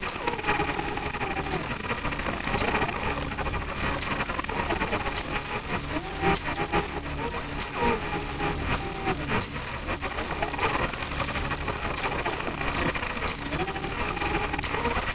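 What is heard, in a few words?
damaged display loop; electrical sparks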